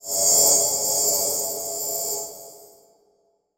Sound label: Title Transition Digital; Production Element Title Transition